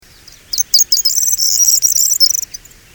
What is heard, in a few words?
Miliaria calandra